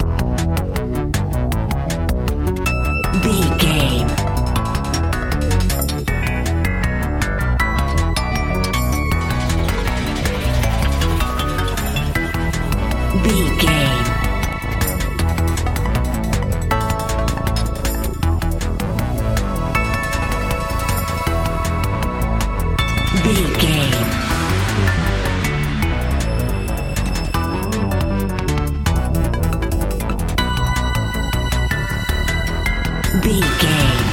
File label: epic video game adventure narrative